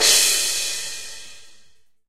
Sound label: soft hit finish; osu!